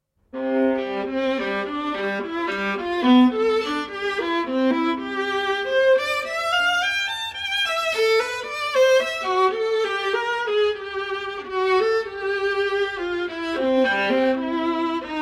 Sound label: viola